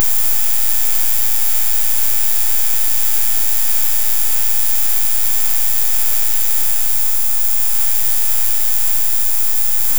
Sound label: electiric, electric